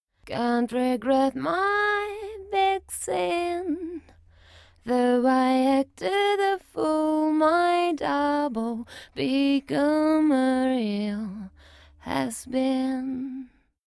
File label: solo vocal